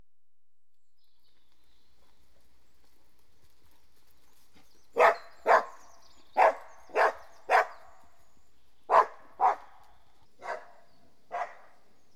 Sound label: Border Collies (About 10 Months); Horses & Dogs; BBC Sound Effects Library